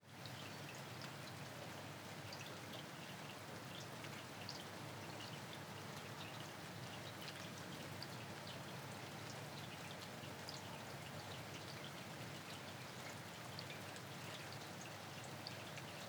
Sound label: rain on roof, ORTF; aXLsound